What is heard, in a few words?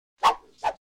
Roar, notifications; Coolpad; ESurfing-No1-2021